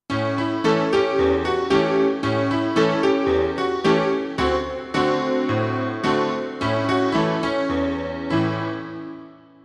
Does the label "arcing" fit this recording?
No